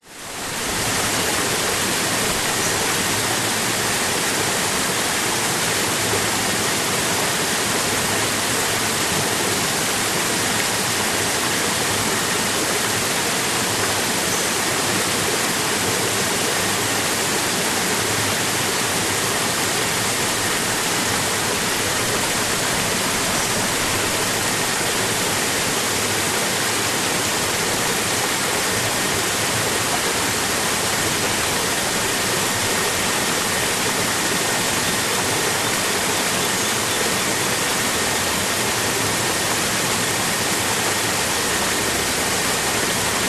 nature waterfall medium rainforest slight distance birds in distance